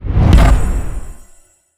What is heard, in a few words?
multicast fan